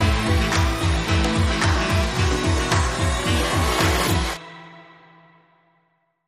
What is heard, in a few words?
Disco